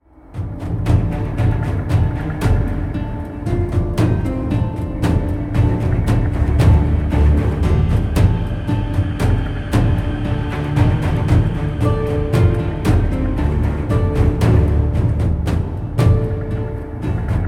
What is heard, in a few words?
Fight Theme Action Loop; Forum; Ryse Son of Rome